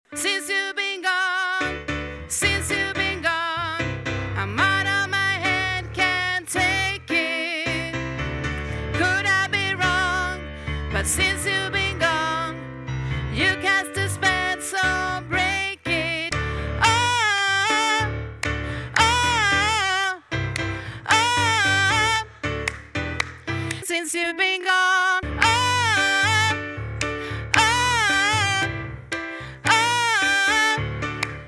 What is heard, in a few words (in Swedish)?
alt, stammor